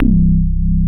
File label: SD1 ANAREZ, synthesizers; Soli-Music; World of Synthesizers VOL-1